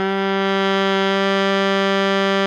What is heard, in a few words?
BARI MF G 2, Sax; Giga Samples Collection; BARI QUICK